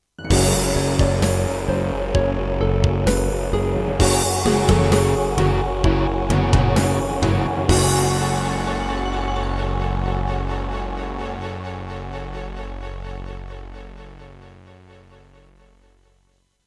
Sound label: Roland SD-50 GS MODE; Doom (Sigil); Introduction